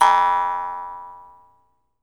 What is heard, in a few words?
jaw harp, Pitched Percussn, Jaw Harps